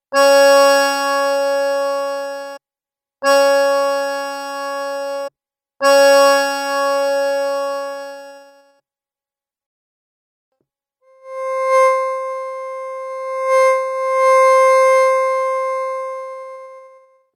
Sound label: reed response tutti sampler